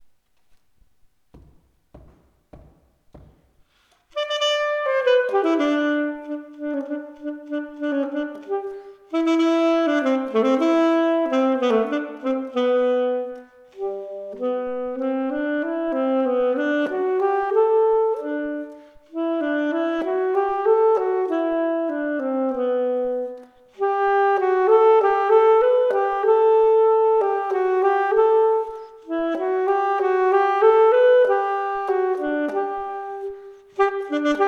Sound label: austrian polka, Skilful Duets Sax